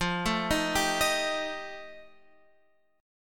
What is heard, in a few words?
F 9th F Guitar Open F, chord; arpeggiated; JGuitar